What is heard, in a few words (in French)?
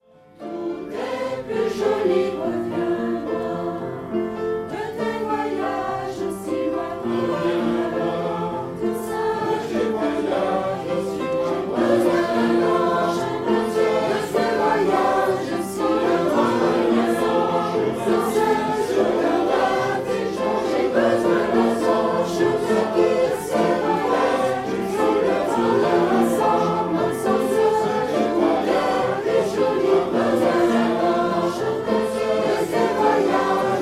ensemble